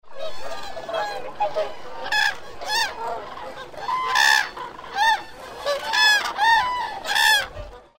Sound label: cranes hornborga